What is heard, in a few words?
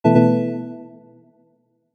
disconnect